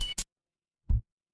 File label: ui low battery